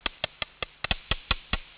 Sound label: sperm, whale songs